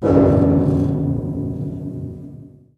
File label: cable rattle; ambient; atmosphere